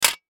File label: CLICK METALLIC; Clicks; Blastwave